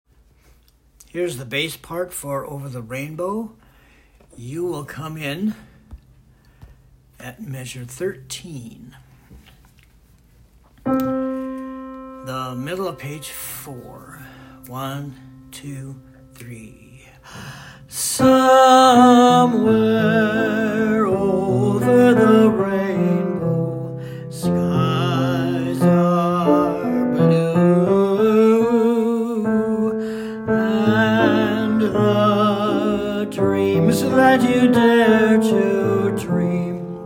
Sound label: bass; SATB